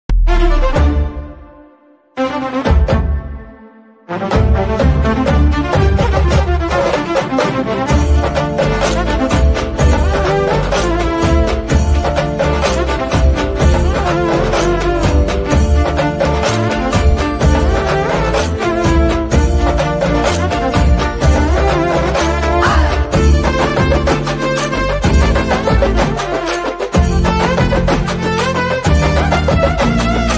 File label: kannada ringtone love ringtone